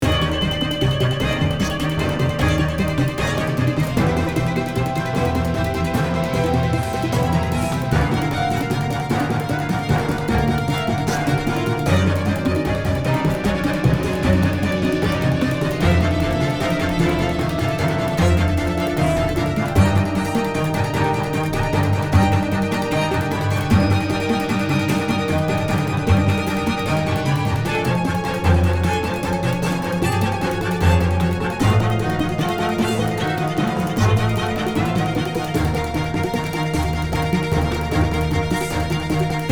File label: Action Loop; The Walking Dead: The Telltale Series